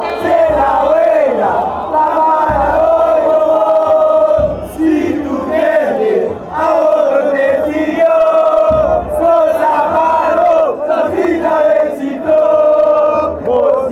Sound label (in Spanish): club olimpia chant, Paraguay